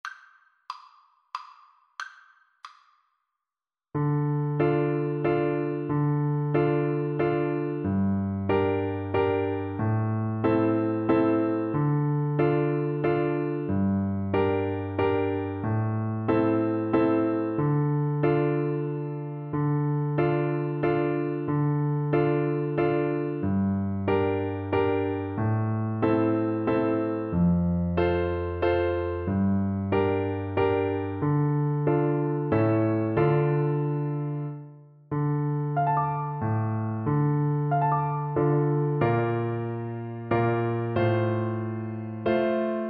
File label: carolans, Tuba